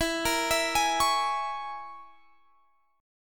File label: E 6th Flat 5th, Guitar, Half Step Down